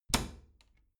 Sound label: bathroom cabinet door close regular